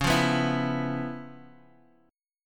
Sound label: Db 7th Sharp 9th Db Guitar Drop D